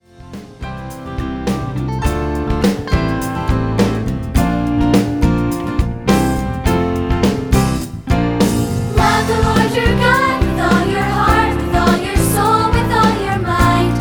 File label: band